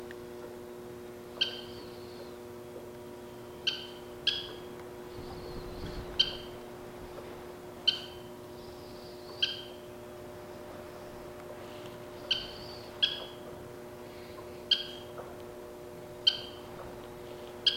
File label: Western Kingbird, Tyrannus verticalis; flycatchers